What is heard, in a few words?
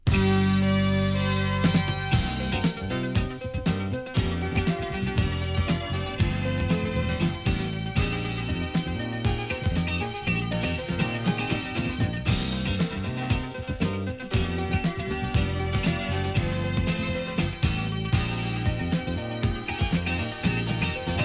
topformat maxi jingle single